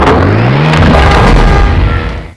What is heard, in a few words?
sentry deploy